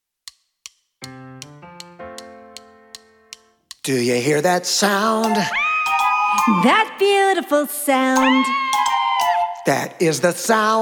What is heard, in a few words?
all vocals; 21st century showcase